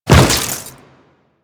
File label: Step Flesh, Footsteps; Warframe; Grineer